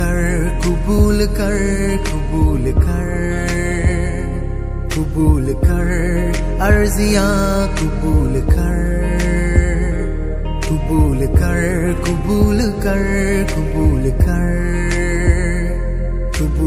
hindi christian ringtone, christian gospel ringtones